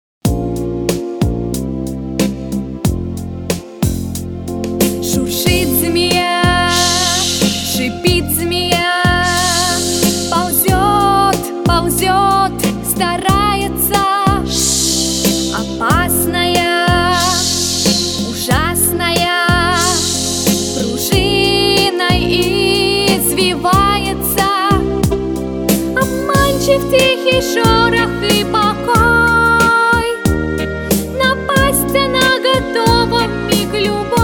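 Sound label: speech therapy songs; pesnya dlya detei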